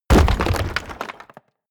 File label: break stone small; atmosphaeren umwelt; erdbeben